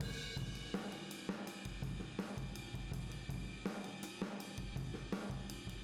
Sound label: Boogaloo Chorus Room M, 82 BPM; Drums from the Big Room The Mixes